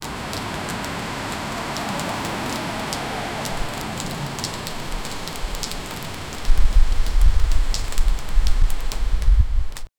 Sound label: tokyo at night neon glow, night